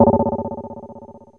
ANALOG BELL; Zero-G; Ambient 1

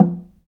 Log Drums, DRY; Roland LCDP11 Africa VOL-1; Wood & Metal

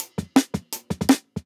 Jungle Breaks

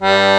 single tone G1, accordion

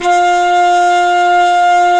WND FLT MT, Flute & Mute

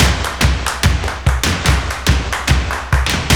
JAPAN DRUMS; Zero G - Ethnic

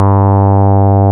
rave beep G1; Best Service Alpha Dance vol.2

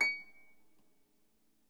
Harp C#7; Miroslav Vitous - Percussion & Harp

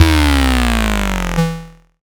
Synth Sounds; neuro; Stanza

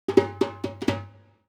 Dumbek Fill; Essential Percussion

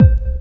DR. LOOP; X-Static Goldmine 3

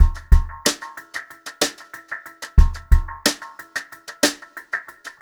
Brushed Drum Loops

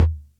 Music Percussion Computer Tom, Drum Machines

